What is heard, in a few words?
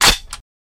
Uzi Metal Mvmt Cocks